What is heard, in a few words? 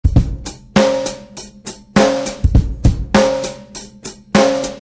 drumloop